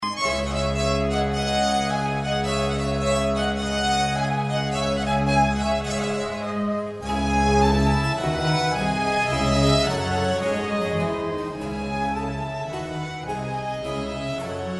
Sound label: concerto violin string; vivaldi